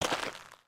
Foot Dirt Sneaker Walk, footsteps

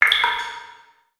Drip; ninjago

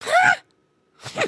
indignant, emotes

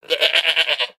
Pets Goat Beg; creatures; Lego

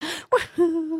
dance; emotes

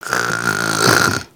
snore in

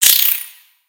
Spider Attack Ranged; Lego; creatures mechanical

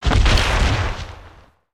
Slam Impact; creatures organic

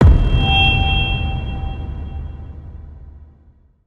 Concert Speaker Complete

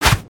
Shuriken throw

